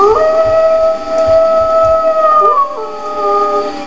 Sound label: wolf, ambience